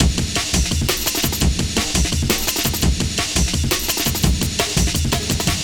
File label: 170 bpm Junglist Beat